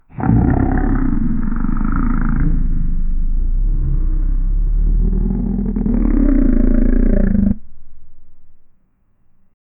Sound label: underwater